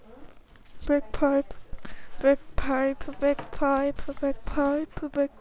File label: bagpipe